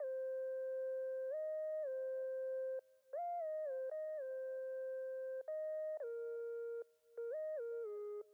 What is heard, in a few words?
115 BPM F Min Lead; Loop Stems; Cymatics; Lovin U